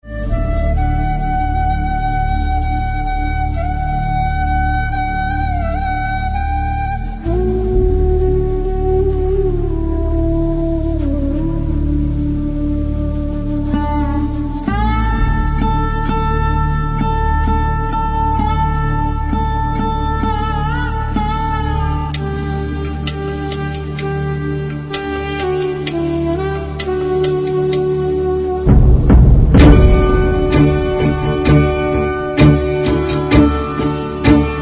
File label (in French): inde hymne; asie